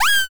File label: pico surprise